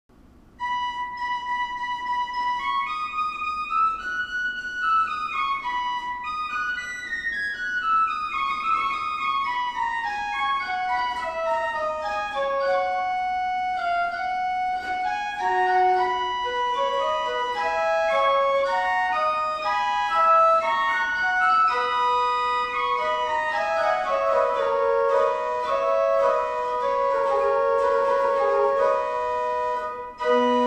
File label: Principal 4, Tannenberg Organ; Gemeinhaus Saal - Old Salem, North Carolina